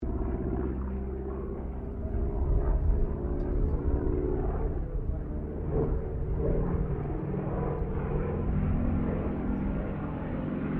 Aircraft CAC CA-25 Winjeel pass, WW2 era Australian training aircraft; Airplanes; Vehicles